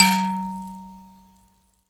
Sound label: PLK Grand Mbira